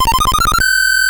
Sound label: Pause, 1-Up